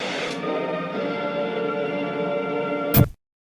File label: Tv Outro Song Static Remix